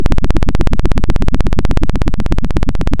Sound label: pop, Sound of a helicopter